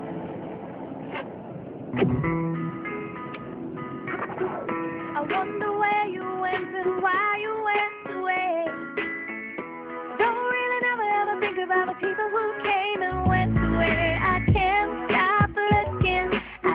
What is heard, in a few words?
Sony Ericsson W8